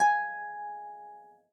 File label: harp